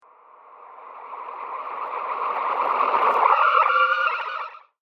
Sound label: Haunting The Transition, ghost sound effect; horror sound effect